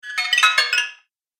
Robotic Clinks Fast; Robot Sound Effects; Sci-Fi Robot Sfx; Andrew Fleming

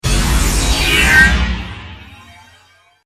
Laser, Beam, Ray, Sweeper, Scifi; BlastWave

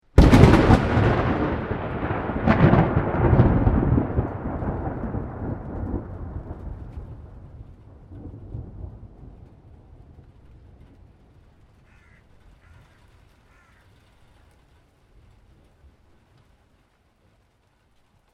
Thunder Clap Loud Close Followed By Crow; Weather; A thunderbolt strikes with a loud thunder crack sound

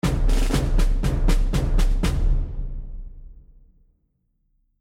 Civil War Drums Stinger; Stinger Sound Effects

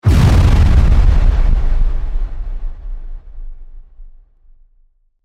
Hit Distortion; Sound Design; BlastWave

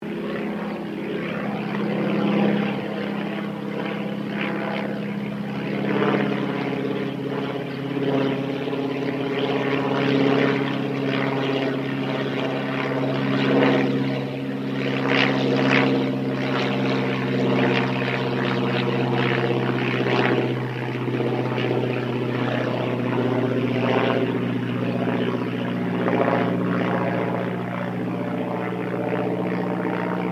AIRCRAFT DH82A DEHAVILAND TIGERMOTH PASS SLOW; 1936; Airplanes; Vehicles

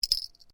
Bell Tiny Ring, Dinner bell; Bells (Sound Effects:Musical)

